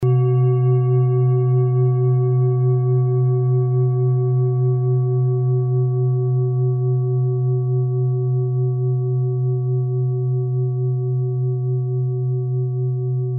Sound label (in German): klangschale set; Klangschale Nr.4; Gewicht = 1320g; Durchmesser = 23,7cm; Höhe = 9,6cm